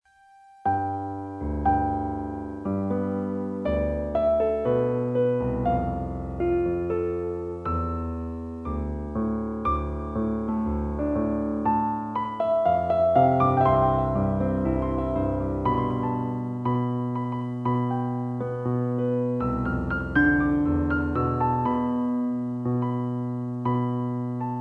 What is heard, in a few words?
Piano